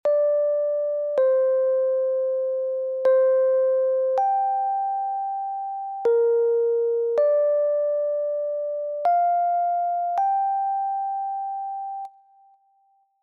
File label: top note